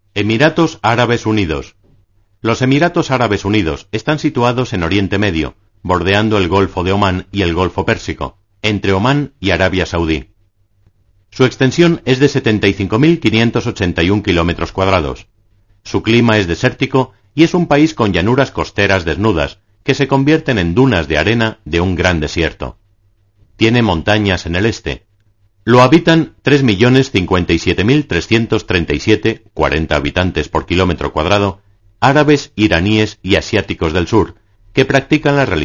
Himno Emiratos Arabes; Burj Khalifa

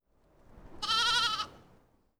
balido, mamiferos

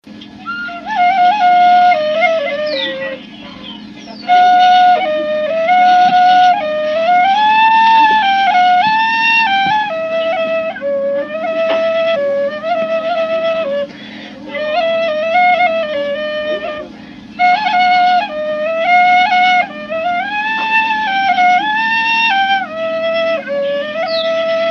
flauta tucumana; criollo

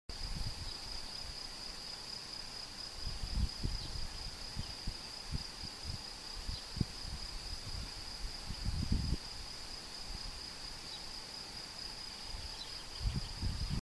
Sonido carraca

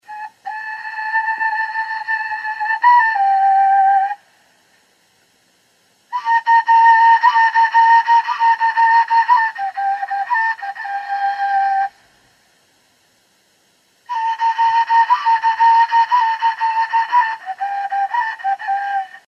toba flautilla

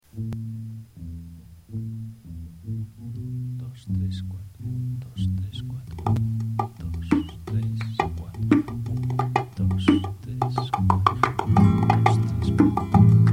rumba

